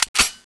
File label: clip in; tfa cso; m1 garand